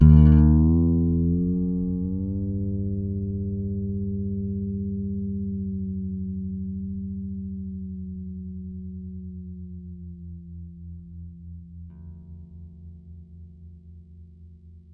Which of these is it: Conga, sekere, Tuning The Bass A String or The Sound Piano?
Tuning The Bass A String